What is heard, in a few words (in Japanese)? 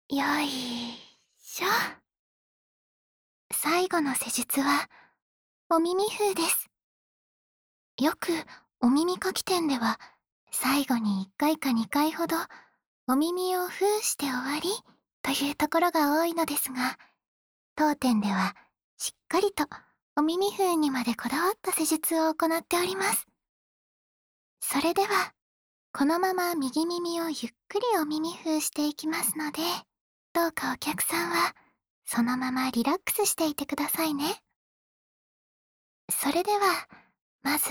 涼やか美人 優しい左のお耳ふー, ASMR; 涼やか美人、桜湯の優しい左のお耳ふー